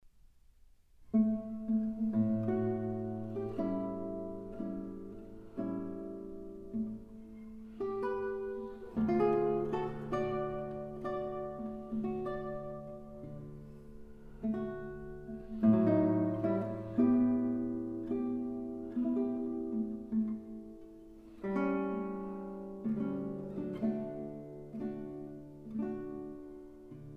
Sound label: Prelude for piano in A major transcribe; 'The Polish Dance'; Op. 28-7